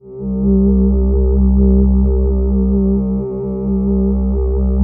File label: ATMO PADS; Best Service; XX Large